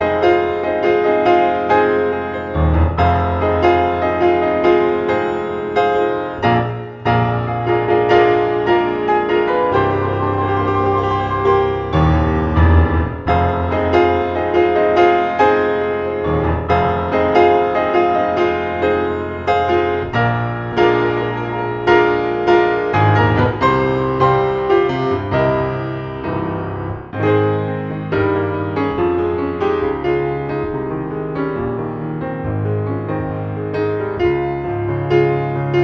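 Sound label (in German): Improvisation am Flügel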